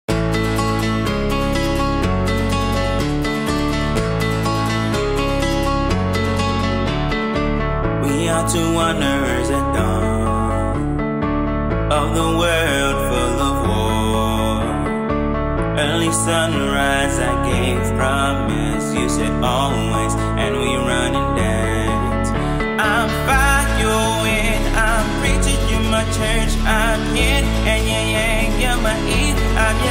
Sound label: Run In Dance; Release Trailer